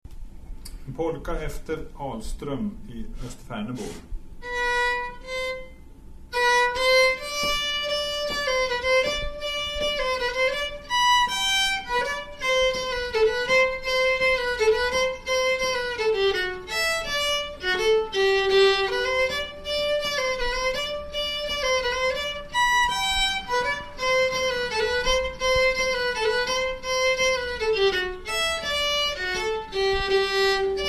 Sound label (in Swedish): Polka; Ahlström